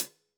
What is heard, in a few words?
KHats Clsd